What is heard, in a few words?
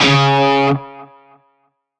Shawzin Oct Two C Sharp; Music; Warframe; Narmer Shawzin